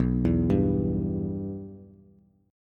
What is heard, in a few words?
B Suspended 4th Sharp 5th B Bass; Bsus4#5 arpeggiated; JGuitar